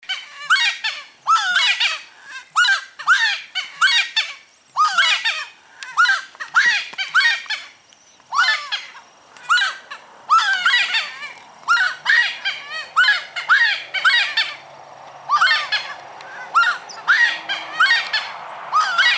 Voz ipacaá; aves

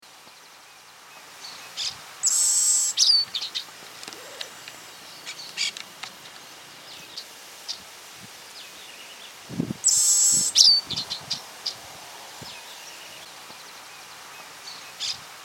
Voz Pecho colorado, aves

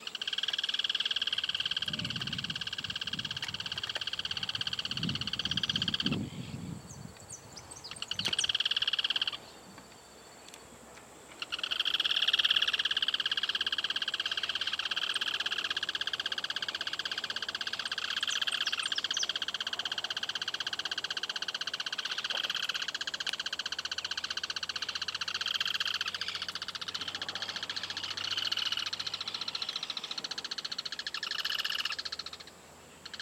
curutié colorado voz; aves

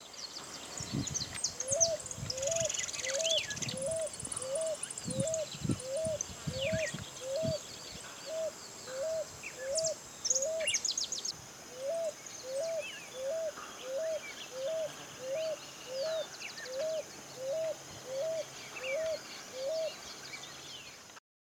Columbina Picui Voz, aves

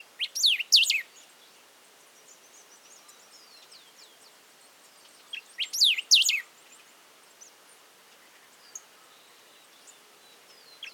Pepitero de Collar voz, aves

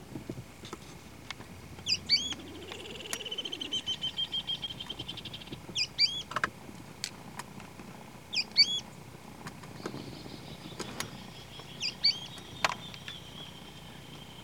pijuí frente gris, aves

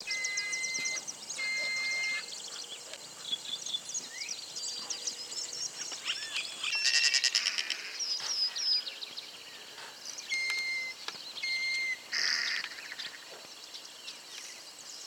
chiflón voz, aves

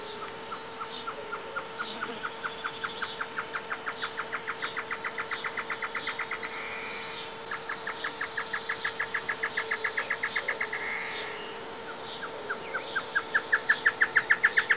Chororó Macho y hembra cantan, aves; Esperanza

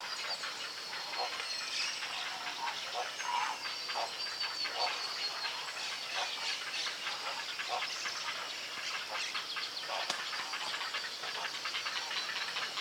Ardea cocoi gritos colonia; Villa alifornia

